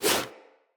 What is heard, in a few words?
Wall Jump Foley; Tenno; Footsteps; Wall Run